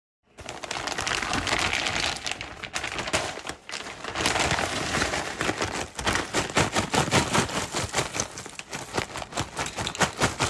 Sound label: Pack of chips